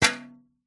vehicles bicycle pushbike metal mudguard hit impact clunk; zapsplat